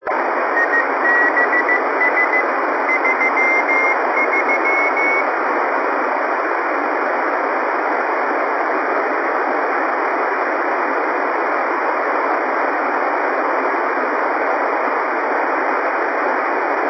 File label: rs-22, Satellite Recordings; 9:15pm Pass; I-Com IC-R20